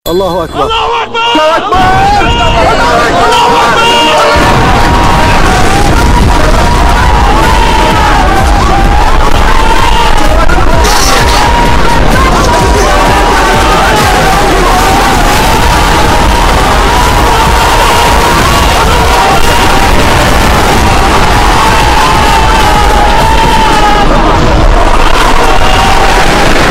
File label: France Anthem